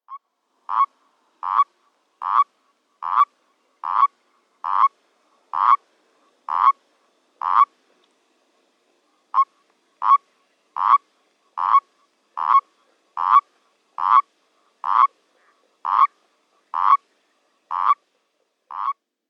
Erdkroete Bufo bufo

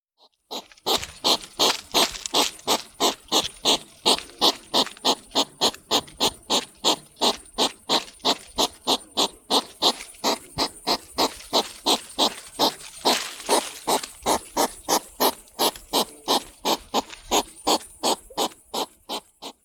Igel Erinaceus europaeus

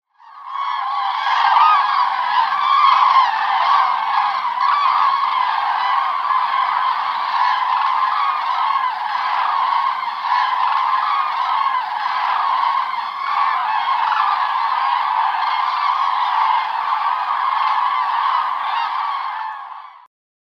Kranich Grus grus

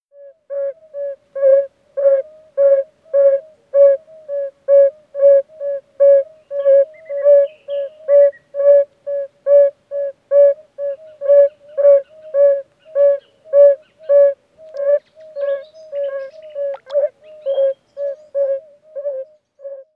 Gelbbauchunke Bombina variegata Chor

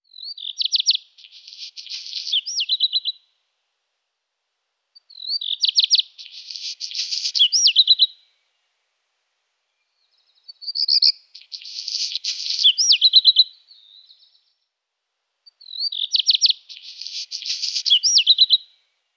Hausrotschwanz Phoenicurus ochruros

